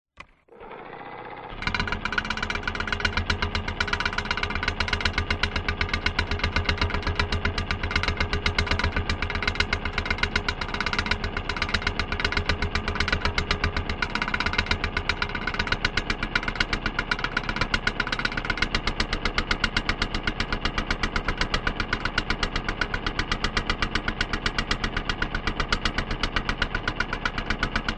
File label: industrial engine motor generator or similar broken clanking; zapsplat